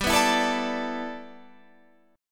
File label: G 6th Add 9th G Guitar Open F, chord; G6add9 strummed; 19,18,16,16,17,16